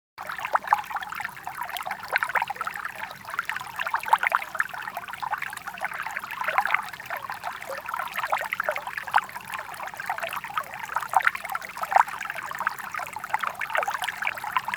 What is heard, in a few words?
water stream small; best service Blue Box